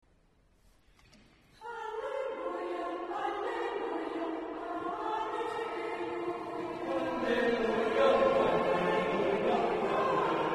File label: Halleluja; Kranichberger Messe; ZWISCHENGESANG